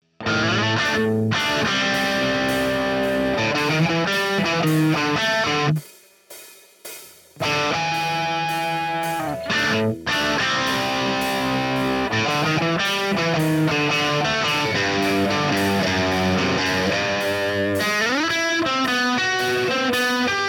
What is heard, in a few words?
CRUNCH, guitars